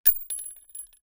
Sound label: cart